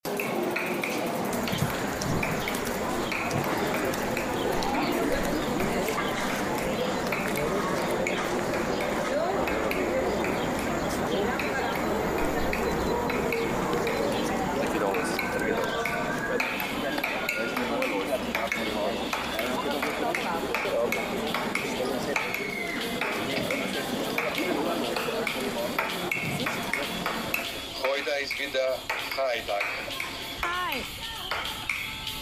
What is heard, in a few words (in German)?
Soundscape Schlendern